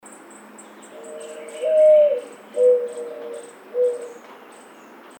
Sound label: Mourning Dove, birds